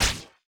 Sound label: shot; sky dragons fury